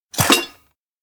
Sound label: shovel hit cookie tin; anemoi